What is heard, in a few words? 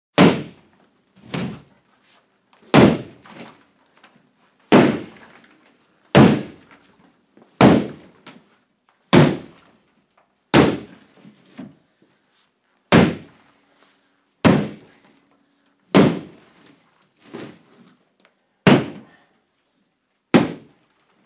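blows on a wall wall destruction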